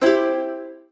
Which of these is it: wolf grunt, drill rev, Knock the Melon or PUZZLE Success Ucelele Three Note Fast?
PUZZLE Success Ucelele Three Note Fast